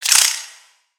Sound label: Spider Alert; creatures mechanical; Lego